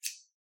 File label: scissors